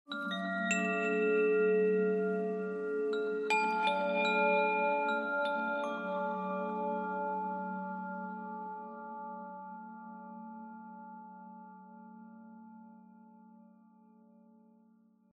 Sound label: ambient chimes